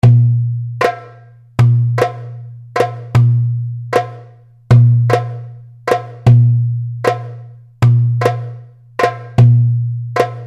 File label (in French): PERCUS DES LIONS